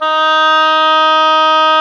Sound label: oboe, mf f; Roland L-CDX-03 Disk 1; Oboe 2&3